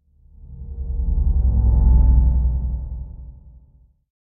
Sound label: Drone Pad Mystical Enter; Hollywood Edge; sound designer's toolkit v2